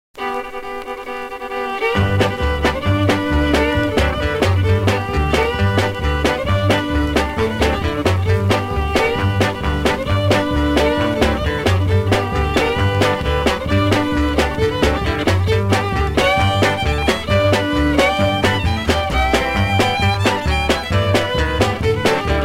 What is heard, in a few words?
instrumental